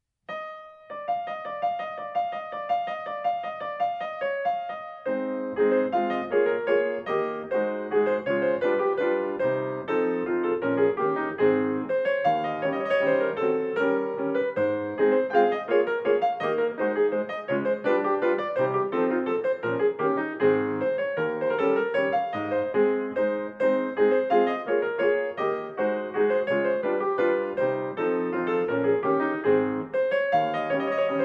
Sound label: chopin mazurka posth a flat major